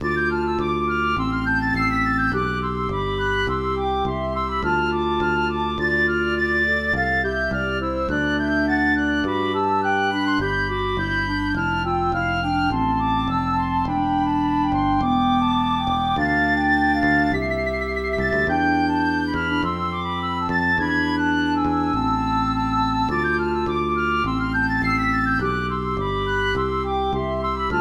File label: Police Song and Action Dance; MTWFC VOL1 Audio Accompaniments